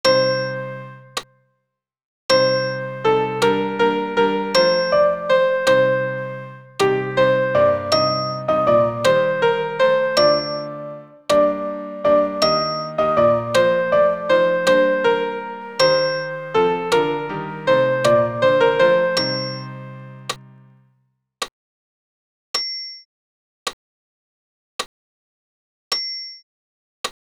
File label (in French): Credo sop pian acc